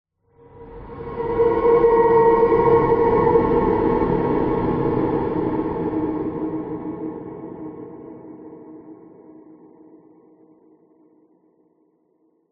horror swell pass by ghostly drone; zapsplat

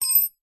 industrial wrench flat drop hard floor; zapsplat

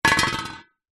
household flask thermo metal drop; zapsplat

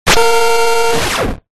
science fiction robot glitch overload shutdown; zapsplat

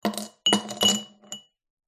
pub ice place in glass; field reports

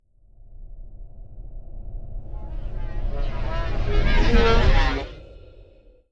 Whoosh Twisted Vocal; bottlerocket

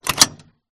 phone booth pick up; the sound pack tree

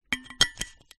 household flask metal lid on

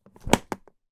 foley flightcase handle movement

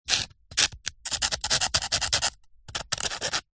rock knife tip scrape scratch; foley

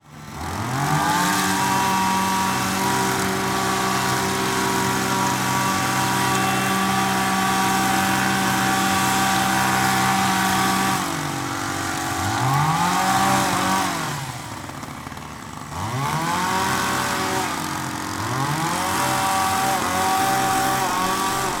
industrial hedge trimmer strimmer operate cutting

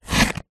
cigarette remove slide out of packet

